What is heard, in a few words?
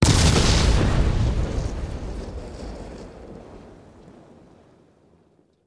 Explosion; Wood Debris; wood & sand